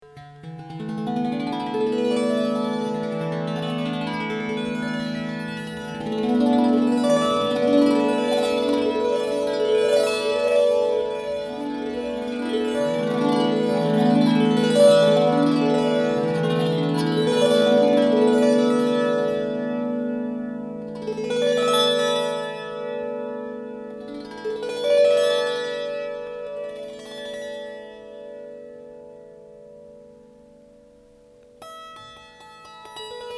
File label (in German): Klangbeispiel tenor Tao-Leier